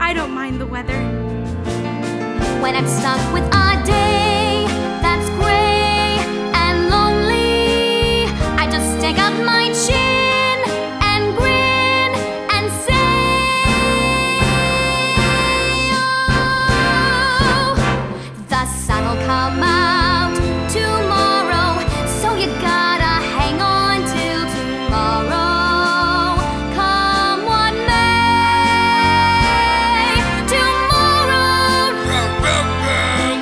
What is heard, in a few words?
Girl Callback Song; Annie KIDS